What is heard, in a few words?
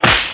slap; Crash Bang Boom